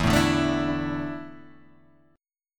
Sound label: E 7th Suspended 4th